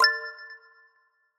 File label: chat login alert